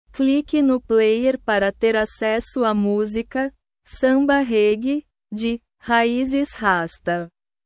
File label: samba reggae